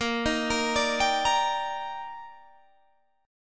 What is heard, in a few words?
Eb Major 7th Bb